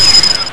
Fade Out; Glider PRO

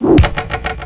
Menu Command; Age of Empires

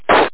pop; Game Power Vol. 1 (HEPP Computer) (1995); INVASION

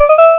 3 notes; IconQuest 1.21; Kids

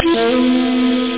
Pipe; Zoom (1995); Active Software, Ground Zero

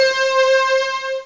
vibe note; The Epic Collection 3; Epic Marketing; muzak samples

